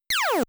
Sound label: Fighter -Shot; Galaga